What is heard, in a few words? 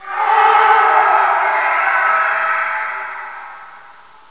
death